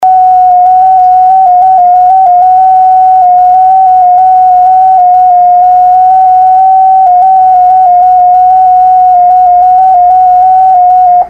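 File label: JS8Call, digital mode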